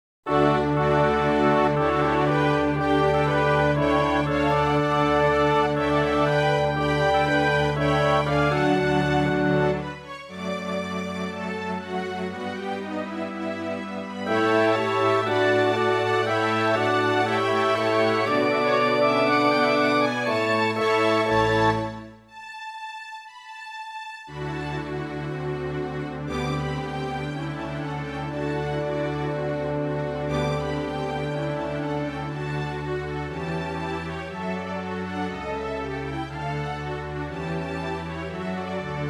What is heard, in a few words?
fl konzert orchester; mozart